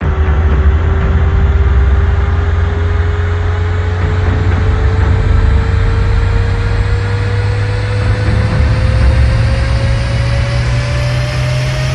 rise long roar

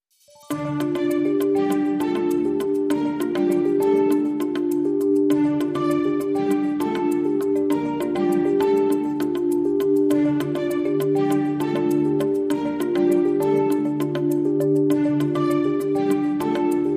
Underscore

